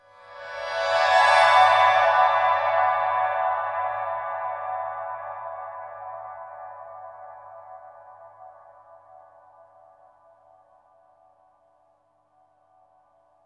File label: GLISSOLIA, Synthy Tonal; Distored Reality